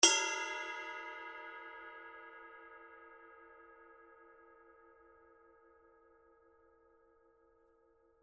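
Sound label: signature 22 dry heavy ride bell, cymbales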